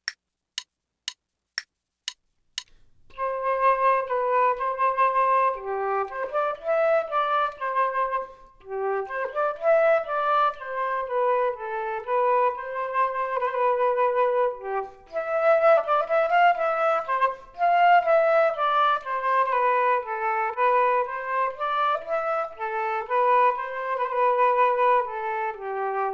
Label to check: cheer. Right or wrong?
Wrong